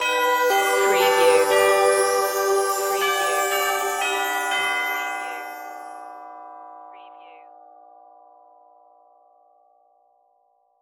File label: auld lang syne synthified outro no drums